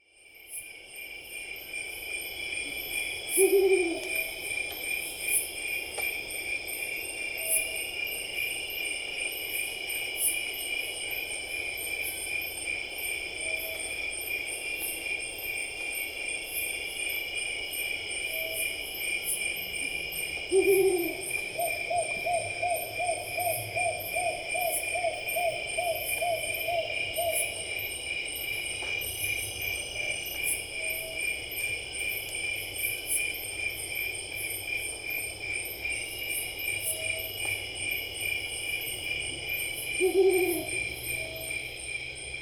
Brown Wood Owl Strix leptogrammica maingayi; tropical birdsong

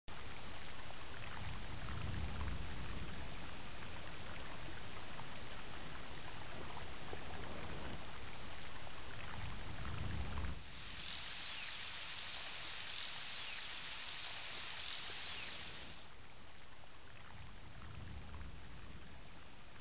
narwhals click buzz and whistle; wilderness songs

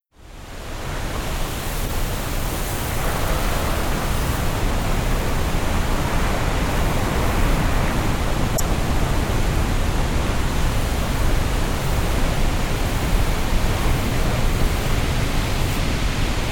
GLACIER COLLAPSE; wilderness songs

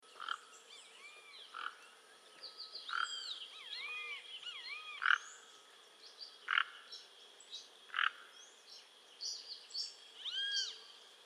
Toco Toucan Ramphastos toco albogularis; tropical birdsong

